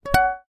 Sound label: job done, notification sounds